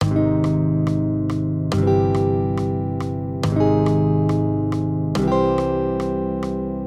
Accompaniment, Major Triads, Root Movement Four Semitones, Ab-C 140